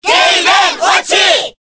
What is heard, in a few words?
Cheer Korean; Mr. Game & Watch; SSBB